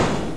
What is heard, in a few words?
Bonus Countdown; Barrack Unity